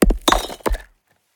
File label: Impact brick rock dirt gravel single hit; PMSFX; Shattering Bricks